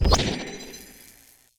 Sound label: portal exit; Portal 2